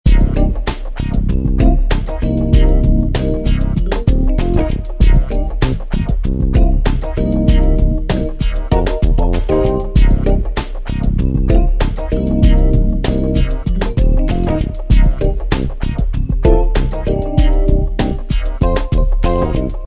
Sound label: funk jazz